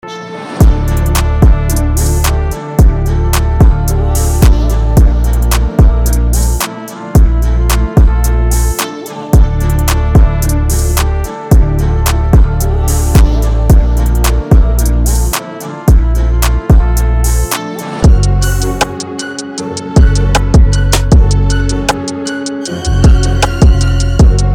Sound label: trap drums